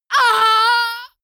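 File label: female combat hit hard, screams; schreie; menschen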